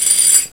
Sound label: DISH